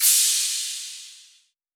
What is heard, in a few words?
Cymbal, Drum One Shots; Anecdote; Hip Hop